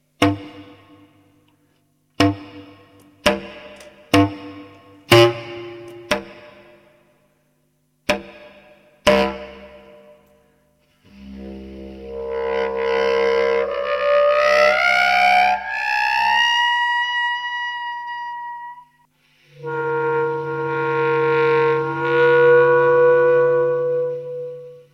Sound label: rock-n slap; acustica